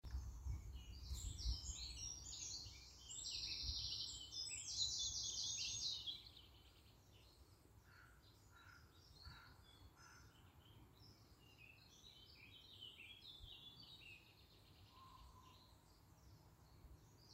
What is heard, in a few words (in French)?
son oiseaux